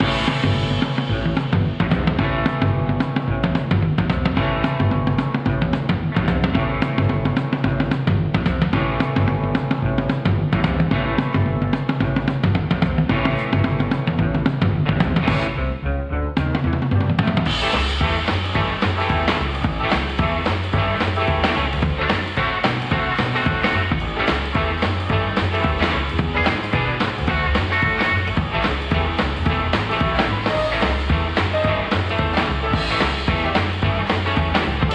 Rock and roll Pinball Machine; lego the incredibles 2018 pc

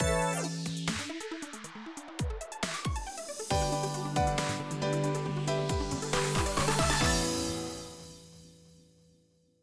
Golf Start Jingle; Nintendo Switch Sports

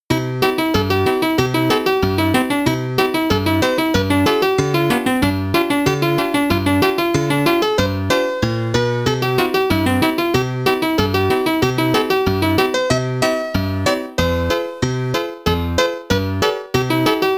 Ragtime

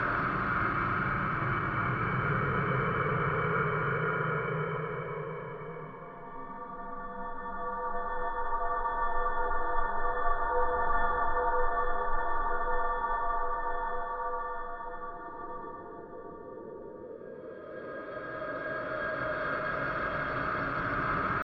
Atmo Loop; Insanely Twisted Shadow Planet

